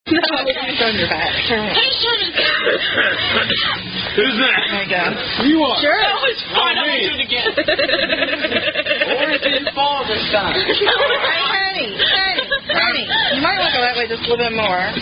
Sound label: Drinking Game; Viking Drinking Game; SCA Drinking Game